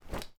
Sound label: fall medium